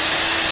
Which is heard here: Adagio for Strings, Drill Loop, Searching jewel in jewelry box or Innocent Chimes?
Drill Loop